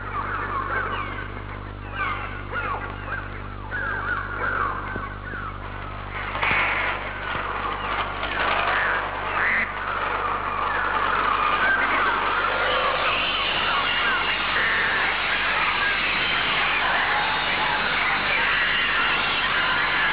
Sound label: birds; hitchcock